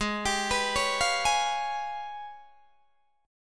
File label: Ab Minor Major 13th, down, Guitar, DADGAD